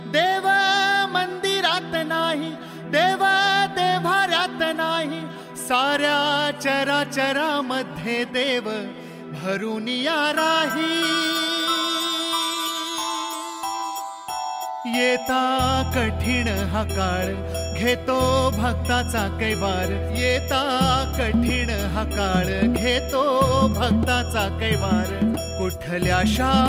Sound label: Marathi Serial Ringtone; Dev Pavla